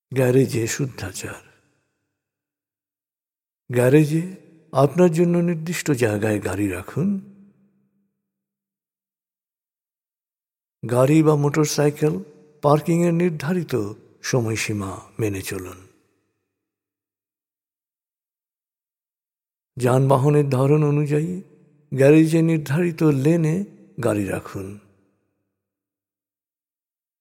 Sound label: manner domestic environment in garage